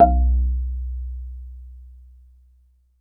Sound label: BASS MARI, Pitched Percussn, Bass Bamboo Mrmb; E-MU Producer Series Vol. 7 – Old World Instruments